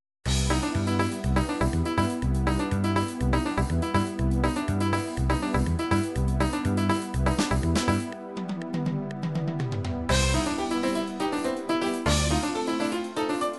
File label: latin bolero, midi